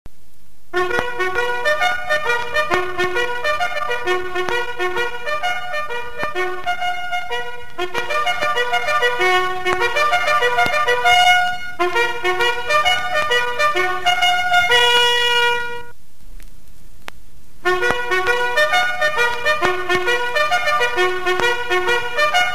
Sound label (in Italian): sveglia; Musiche di caserma